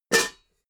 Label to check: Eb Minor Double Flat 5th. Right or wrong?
Wrong